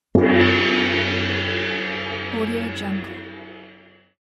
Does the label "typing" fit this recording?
No